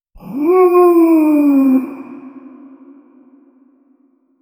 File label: create a powerful masculi, feline